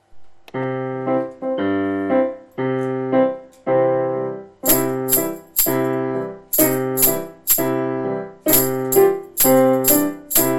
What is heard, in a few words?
music with ntefi